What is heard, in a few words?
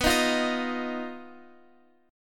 B Major Flat 5th, Guitar, Open F; BMb5; strummed; x,x,11,10,8,10